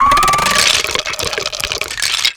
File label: coffin skeleton; ambient; dota; diretide